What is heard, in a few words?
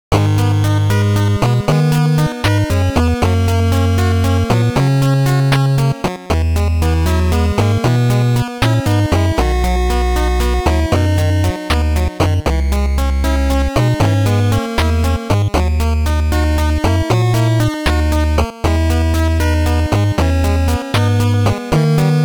Bad Ending; Turbo Racing; NES